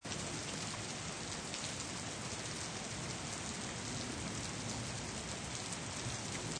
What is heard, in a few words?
Rain Stereo Loop Long, Natural rain loop, long version; Seamlessly looping sound; Weather